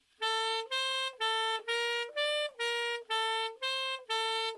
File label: saxophone solo slow first part